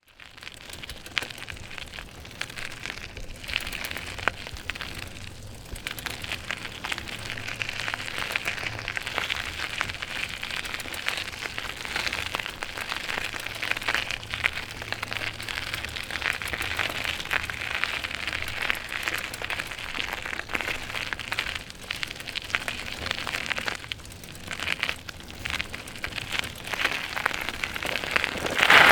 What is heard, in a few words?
Bicycle, Wheel, Perspective, Gravel, Uphill, Slow, Speed, Skid; Rzpost; Ride on Bike